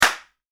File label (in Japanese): クラッカー